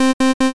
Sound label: machine error